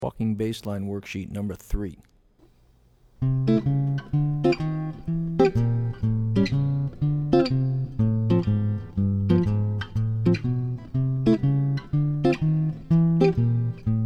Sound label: Walking Bass Lines; jazz chording